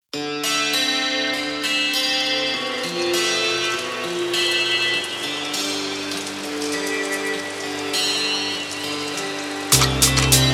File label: beats